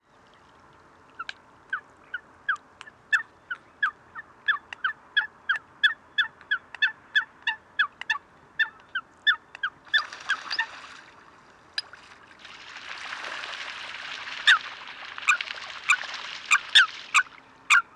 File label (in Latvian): laucis fulica atra; Eurasian Coot (Fulica atra); putni liepajas ezera lauku iela